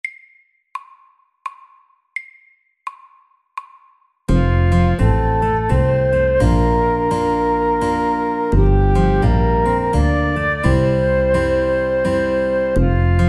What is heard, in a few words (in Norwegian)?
Shuffle SAKTE